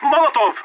eu choro

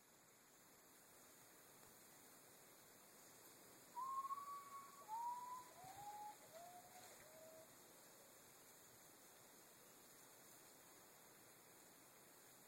canto do urutau pai da lua

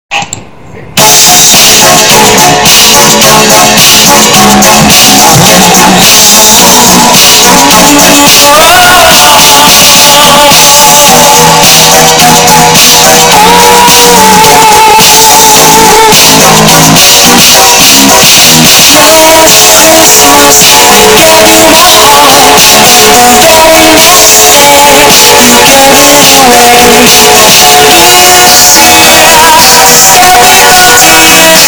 estourado bass boosted

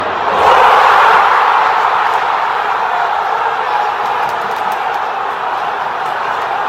gol torcida

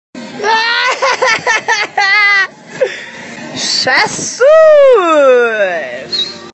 risada

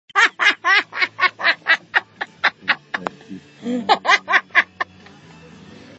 risada

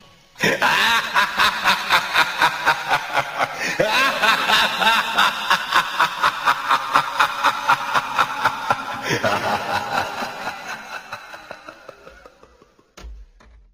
risada

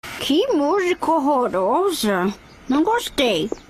musica horrorosa

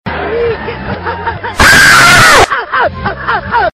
explodindo invertido; kiko

